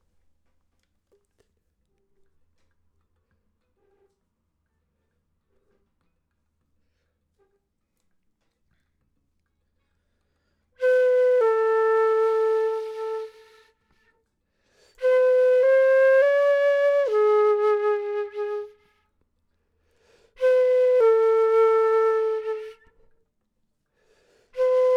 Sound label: flute; logic